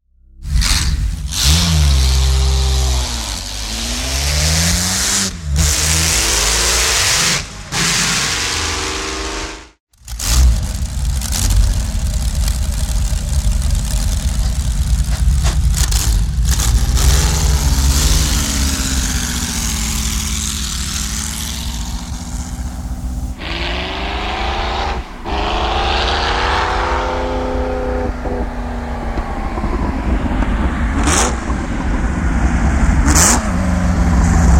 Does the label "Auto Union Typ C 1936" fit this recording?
Yes